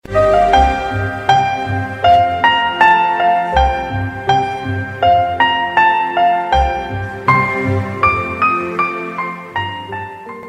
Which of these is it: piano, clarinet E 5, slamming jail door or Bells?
piano